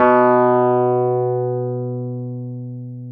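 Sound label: Wurlitzer electric piano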